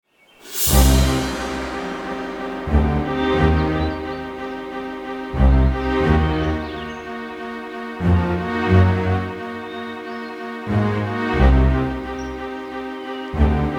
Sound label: Peace Tense; Serious Sam 2; Ellenier Town